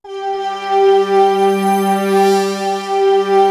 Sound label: PADS; East West - Global Sounds